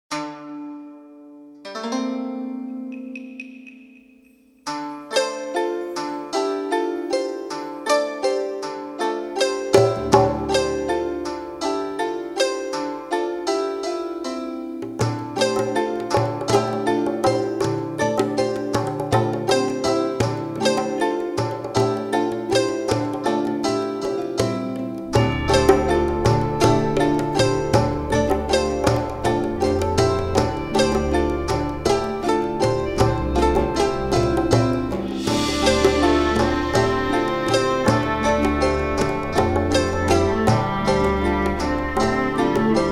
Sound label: dan tranh